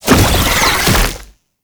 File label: Proto Infested Brachiolyst Hover Start; Warframe; Abilities